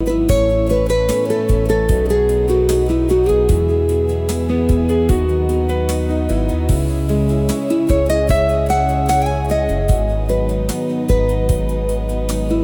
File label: loop